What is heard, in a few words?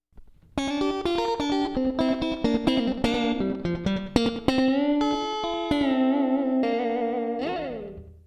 pickin